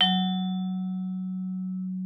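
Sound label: vibraphone F#3; Xsample VOL-4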